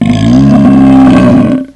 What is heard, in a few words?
BELCH, VOICES